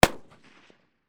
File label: Rifle Enfield 303 Incoming; World Wars; WatsonWu; Rifles & Pistols Of The World Wars